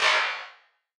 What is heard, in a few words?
Wider Clap; stereo toolkit samples